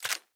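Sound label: inventory items ammo; STALKER; IWP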